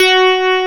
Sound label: FM G3, ELECTRIC PF, Piano; AKAI S6000 CD-ROM - Volume 1